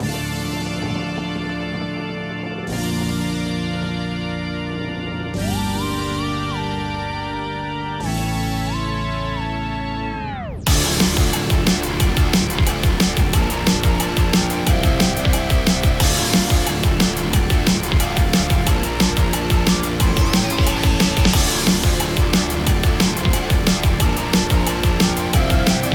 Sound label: (Spooky Mix) (Instrumental); Friday Night Funkin' Spooky Mix Volume 1